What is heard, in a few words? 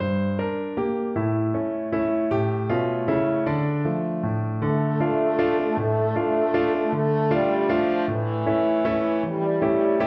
tumbalalaika, french horn